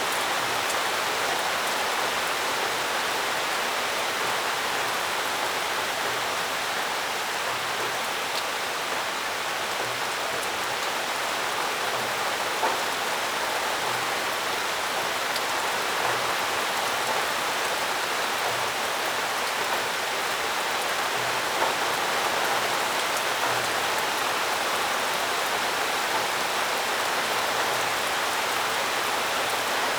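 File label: rain medium; WAR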